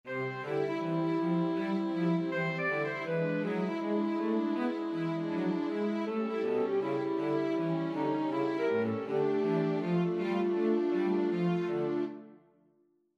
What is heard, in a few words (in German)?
3 Instrumente Streicher